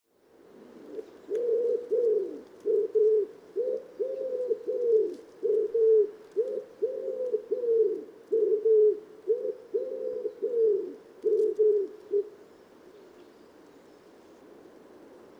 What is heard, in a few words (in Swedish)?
ringduva Columba palumbus sang